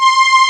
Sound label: Strings, Amiga Soundtracker; ST-XX Amiga Soundtracker Sample Packs